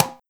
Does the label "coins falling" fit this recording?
No